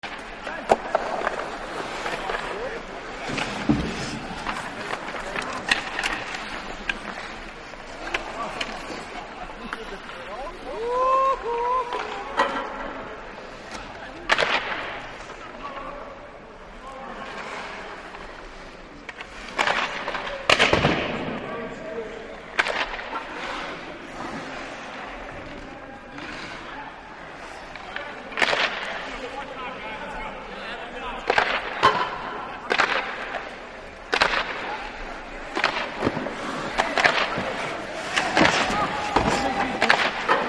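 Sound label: Hockey Players Practice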